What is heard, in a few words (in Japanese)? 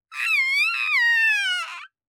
悲鳴(大ダメージ); バトル系